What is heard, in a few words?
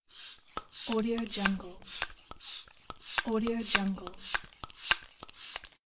air pump